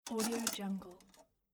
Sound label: small candle lantern open; mountainaudio